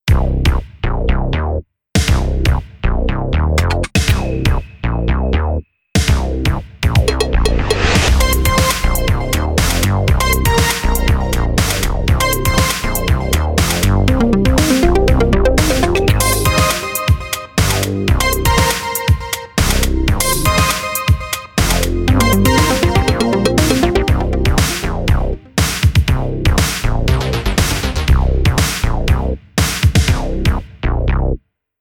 jingle

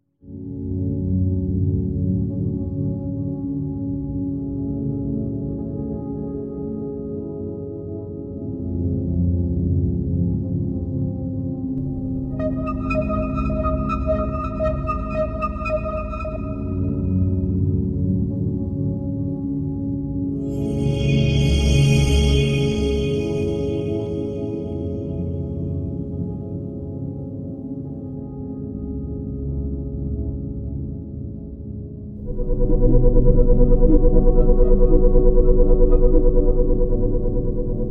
ambient; X-Bow Software; 2004 - Star Wolves

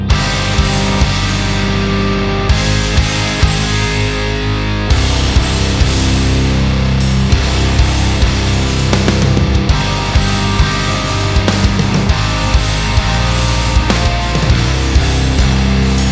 Instrumental Version

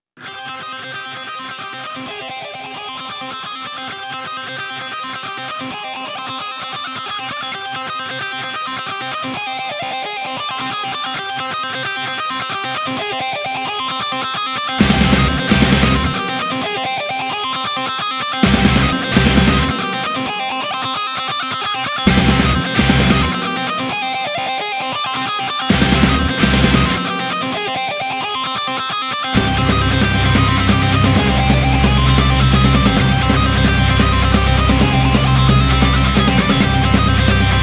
overture instrumental

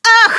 hit, human woman fight; Stalker Anomaly

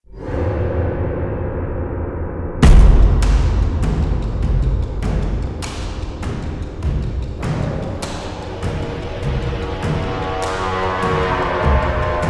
instrumental

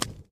crackle, anomaly; Stalker Anomaly

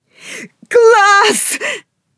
laughter, woman; Stalker Anomaly; human

